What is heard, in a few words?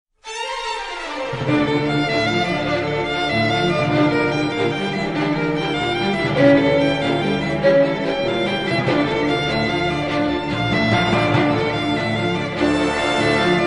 Advance msg tone